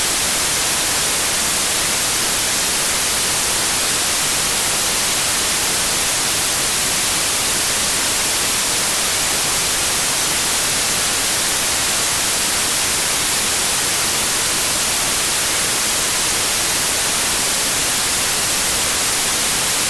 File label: White Noise with 10 minute and 1hr warning